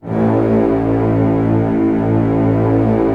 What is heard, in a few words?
orchestra A0 FF; Sound On Sound - Peter Siedleczek's Orchestra